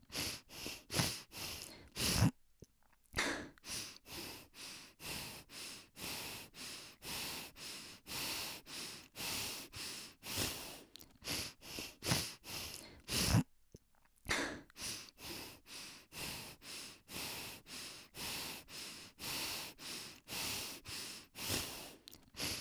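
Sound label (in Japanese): 呼吸音（鼻呼吸）ロング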